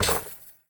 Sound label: Street Ball Glass Basket Chain; SFX Kit